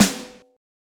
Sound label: snare drum layering; fett klingen lassen; Mixdown Tutorial: Snare Drum anfetten